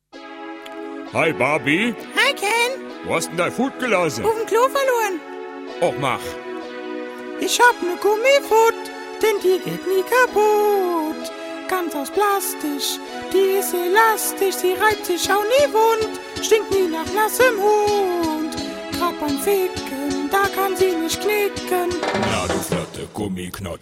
German

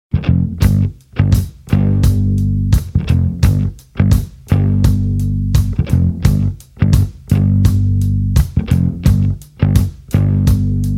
Guitar bass

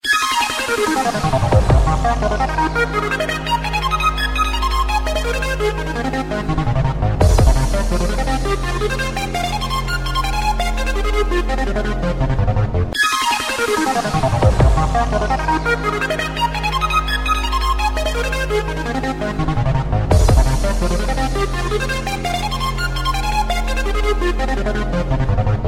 Epic Prelude

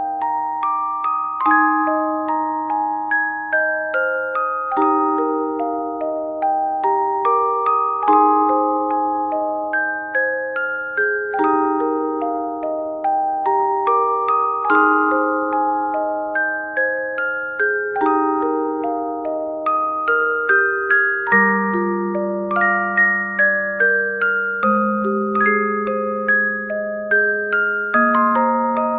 Music Box

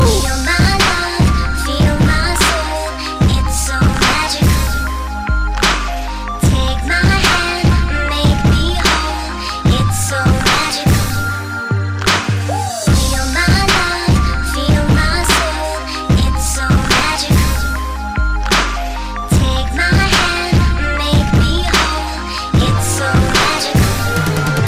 So Magical Music

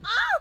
oof; Logan Paul

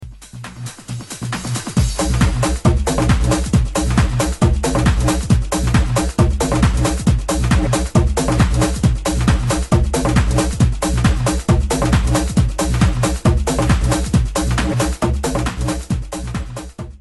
Electro Hit

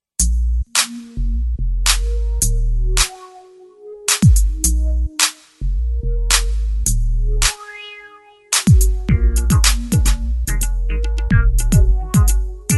Extreeeem Bass

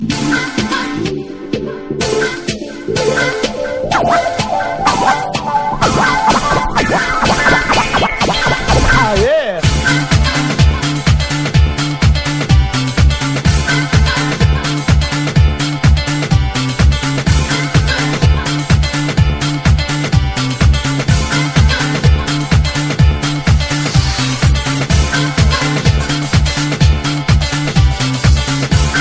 House Music

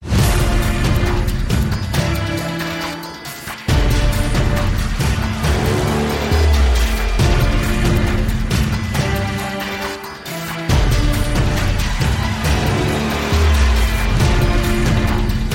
Saw

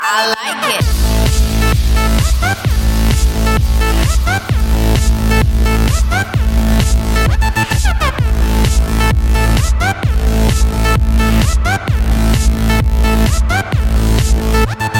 Basstype

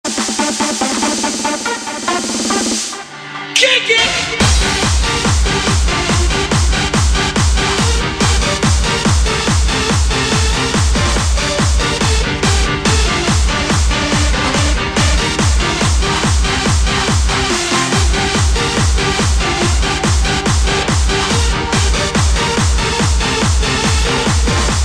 HARD STYLE

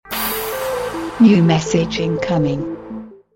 New Message Incoming

